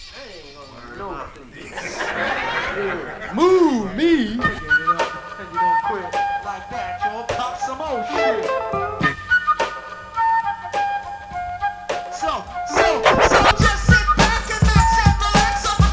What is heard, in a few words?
Flute loop